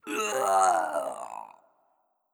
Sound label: Hero Death